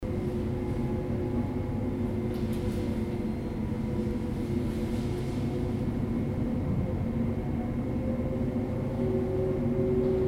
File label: Hosp Floor; hospital atmos, lifts floor B; field recordings